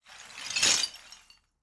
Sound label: rock tiles shaking; matter mayhem; soundmorph